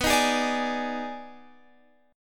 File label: Eb Major 7th Suspended 4th Sharp 5th B